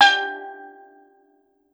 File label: STEEL DR, Steel Drum Lead Pan; E-MU Producer Series Vol. 7 – Old World Instruments